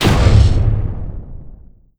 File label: boom